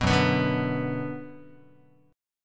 Gb Suspended 4th Sharp 5th, Guitar 4A,4E,5A,5D,5G sharp,6C sharp; strummed; JGuitar